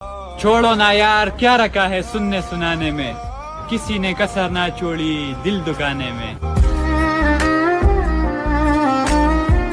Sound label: Sad Breakup Ringtone